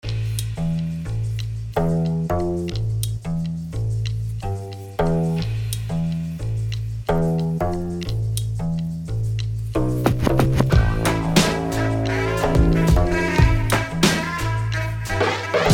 gimbri

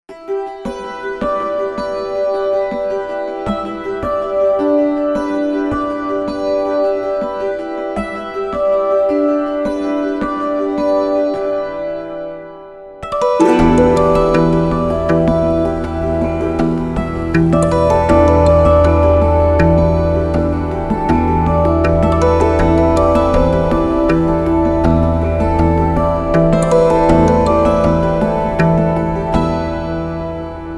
autoharp; CINEMATIQUE

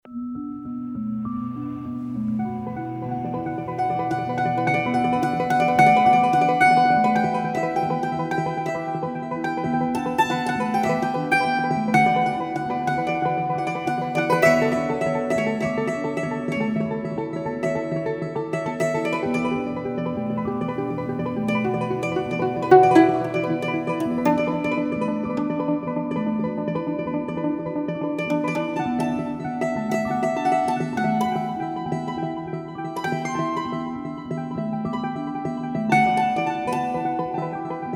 mando

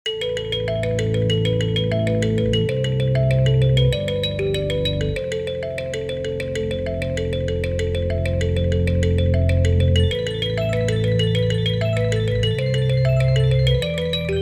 kalimba

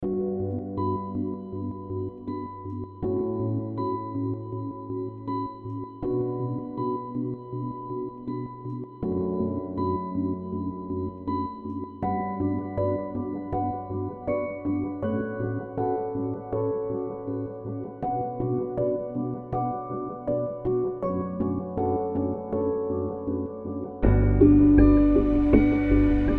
lapharp

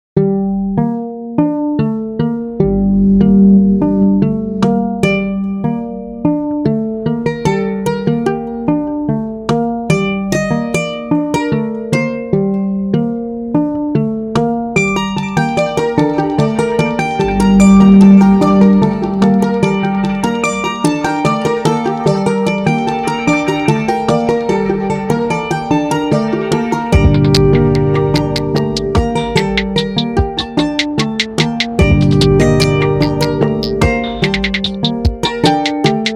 mando

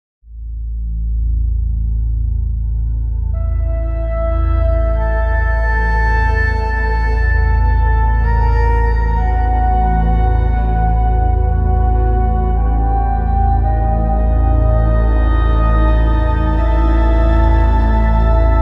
vertigo wind

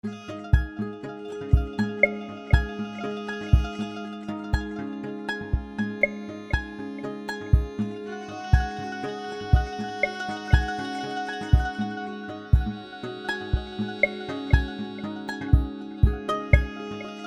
balalaika calm sundown

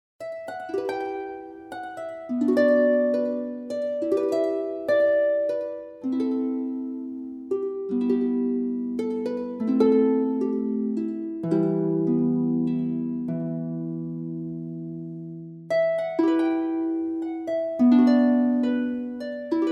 grand harp

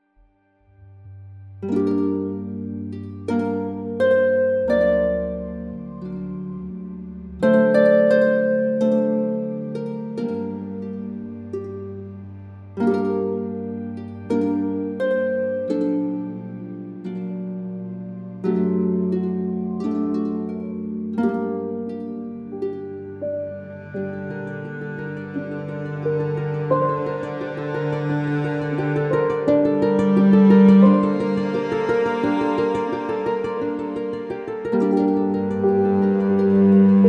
grand harp leaves falling

